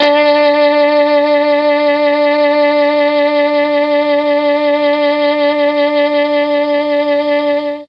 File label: D3 MKII sax, mellotron